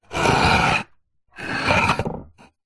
household swimming pool skimmer cover stone tile slab movement slide; zapsplat